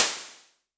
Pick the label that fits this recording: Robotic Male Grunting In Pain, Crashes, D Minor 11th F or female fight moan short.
Crashes